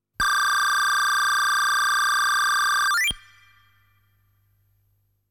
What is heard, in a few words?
Hit Point Recovery SFX